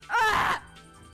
euahhh

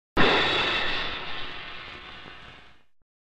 cymbal crash; disney

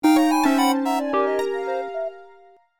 synth

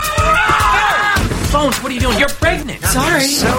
ringtone

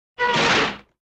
screechy door slam

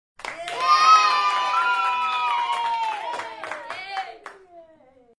yay clapping

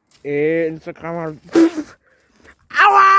auaaaa

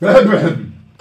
caugh

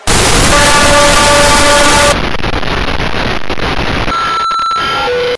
jumpscares sound; five nights at nomura offline

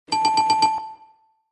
winning bell game show correct

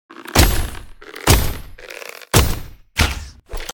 bow

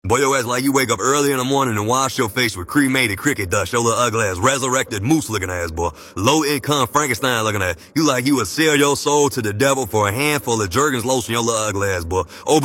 acoustic

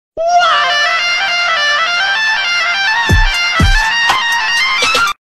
scream remix; mario

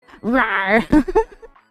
rawr

